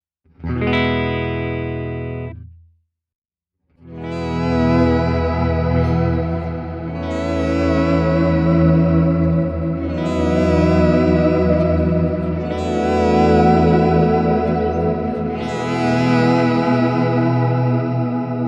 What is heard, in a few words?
Vibro Swell